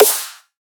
Dubstep Snare; Ghosthack; Dubstep Sound Arsenal

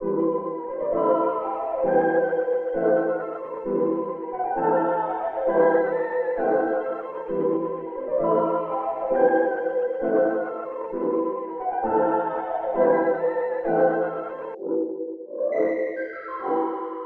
Hero 132 BPM E Min; Cymatics; 2022 Melody Collection

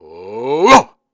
Grunts and Chants, Viking Vocal

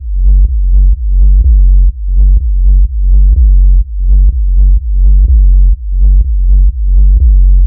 Bassline 125 F; Vaskularr

